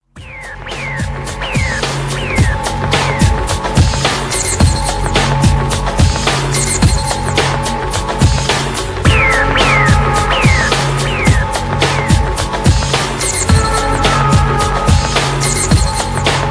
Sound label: Metro Music